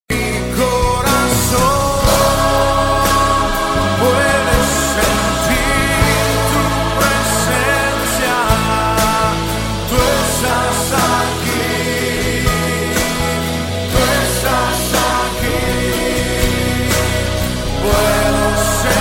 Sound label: Spanish